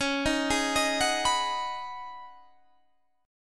Db 7th Suspended 2nd Suspended 4th Db down Guitar Half Step Down; Db7sus2sus4 arpeggiated